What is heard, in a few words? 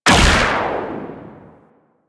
TnT Shot; DE; Warframe; Weapons; Archwing; Powers